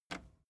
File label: record player repeat release